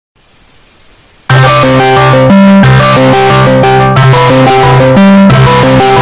calling sound earrape; discord

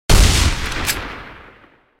shoot; pixel gun 3d harsh punisher

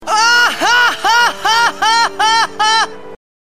toms

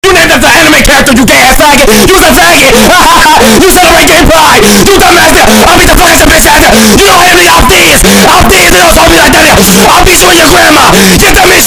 packing

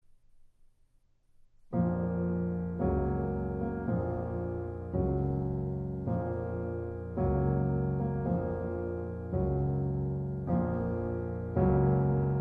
funeral march

